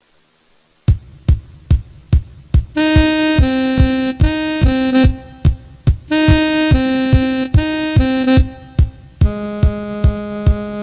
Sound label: 144bpm Tenor Sax; Up 5 for alto